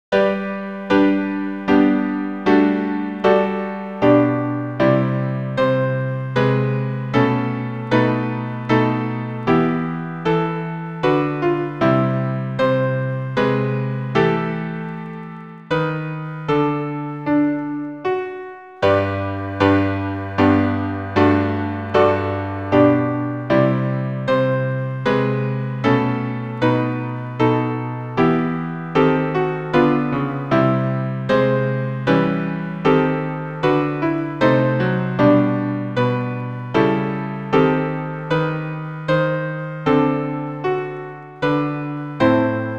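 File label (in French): tous piano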